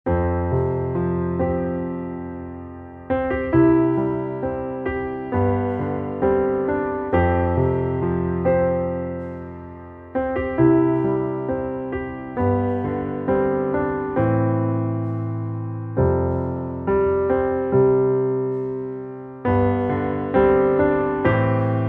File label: Underscore PIANO; Worship Flow